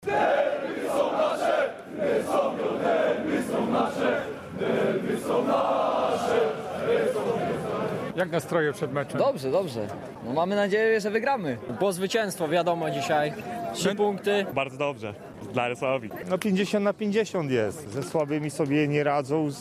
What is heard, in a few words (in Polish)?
kibice; kibice resovii szli z rynku na stadion; mecz ze stala